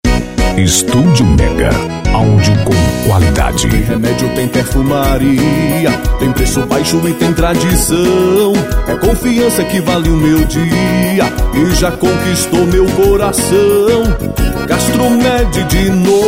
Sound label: JINGLE, Forró; CASTRO MED DROGARIA